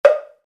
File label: temple block set; black swamp temple block set 5; log drums temple blocks